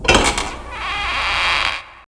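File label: DOOR open; thief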